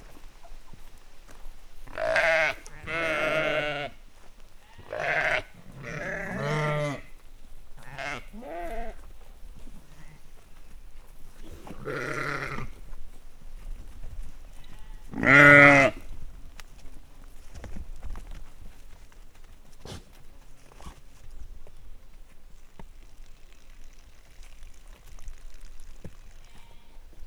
vonulnak pisilés, juh; állathangok; paraszt udvar

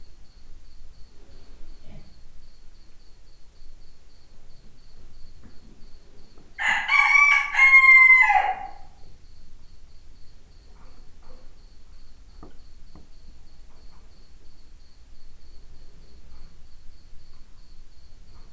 kakas póni fürj tücsök; parasztudvar

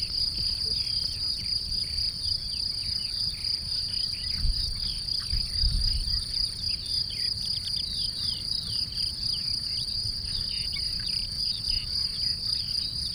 sok tücsök és madarak lengedező szél, Hortobágyi puszta; termeszetben

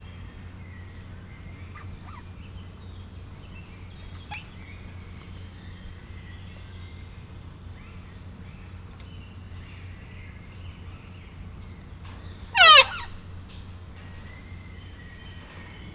kisse indiszponaltan, csupaszszemu kakadu; miskolc zoo

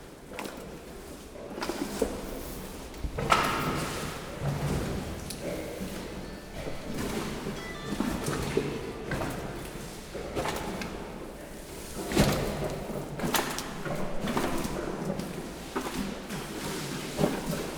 egy nagyot fújtat, nílusi víziló; pecsi zoo 2017

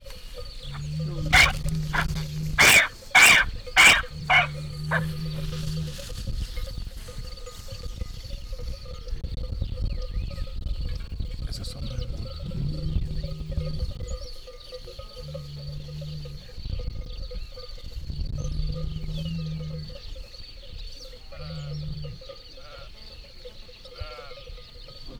autóból szeles idő, vadszamár kulán; hortobágyi nemzeti park 2013

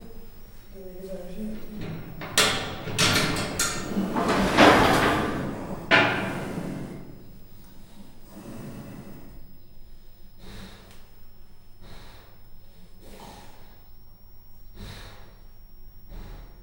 rácsozat kinyitása, halk állat, perzsa leopárd; miskolci zoo 2018

